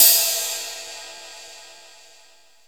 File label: brush crash, Jazz Brush